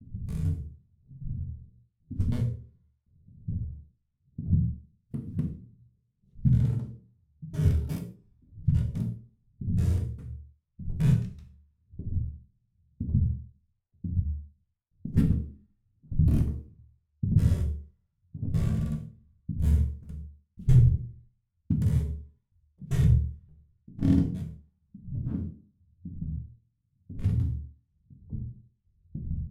Footsteps Upstairs Wooden House With Ceiling Creaking